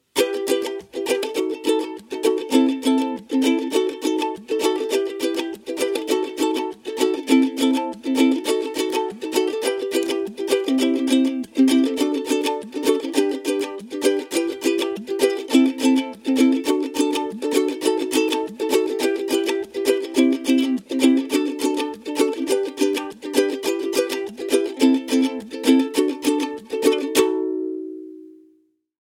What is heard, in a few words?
Rumba, ukulele